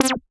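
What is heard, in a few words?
analog click; Effects; Ableton; Creative Extensions; Poli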